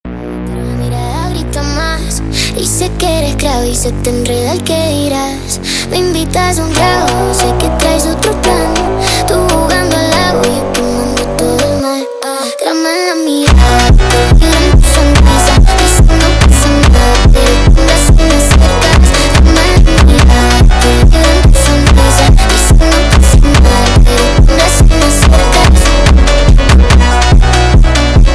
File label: sped Up; funk